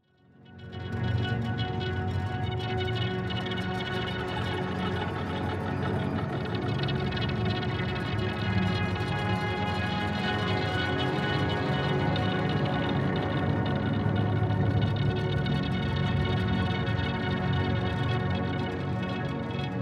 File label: Suspense Theme